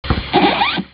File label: record scratch